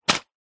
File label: household medicine tablet pack box set down; zapsplat